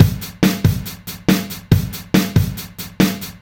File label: LoFi Beats, 140 BPM; Vengeance - Essential Clubsounds Vol.4